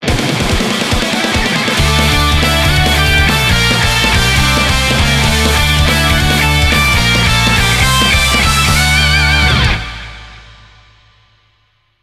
License Get; Gran Turismo 5

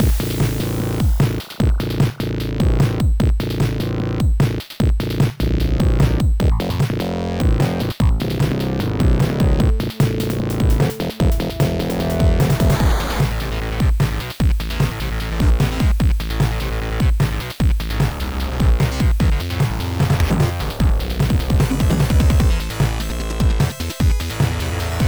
Sink (YM2612) loop; WrestleQuest

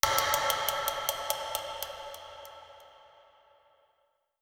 Percus; Koloro

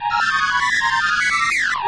Ditty; Star Control 2; 3DO; Chenjesu

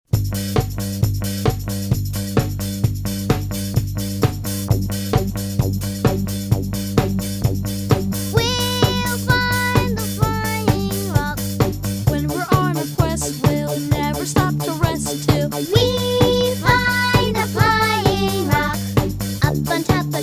Flying Rock Song; Nick Jr Radio

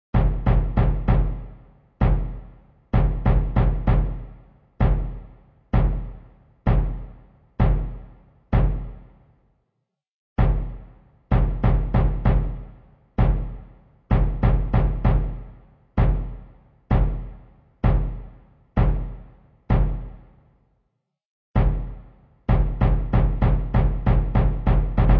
Harp Drums

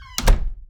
Double Doors; the princess and the frog; Restaurant; Act Closet

